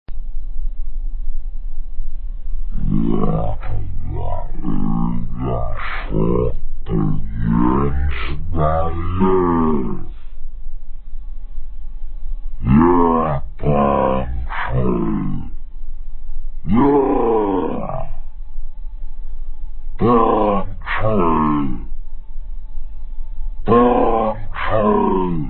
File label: why banjo; vapesquared